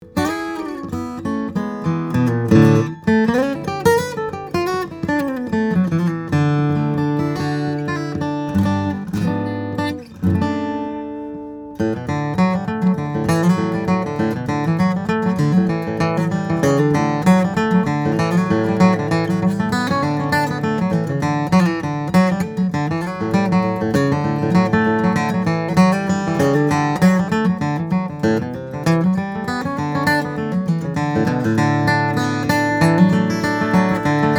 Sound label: Santa Cruz VA, steel string guitars flattop; the tree; mahogany; sitka spruce